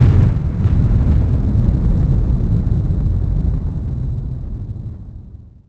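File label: thunder far; envrn; The Elder Scrolls III Morrowind GOTY; XBOX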